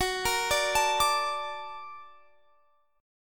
A sharp Augmented Major 7th

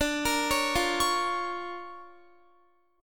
D Minor Major 7th Sharp 5th, D, Guitar; DmM7#5; arpeggiated

